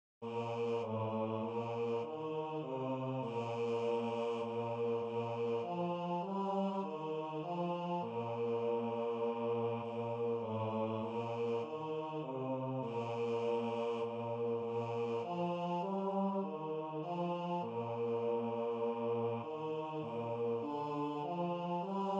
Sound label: Bass